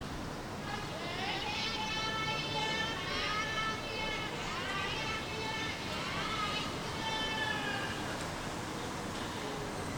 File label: gypsy call